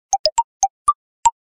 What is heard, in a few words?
Kalimba, notifications; Tecno Spark 40C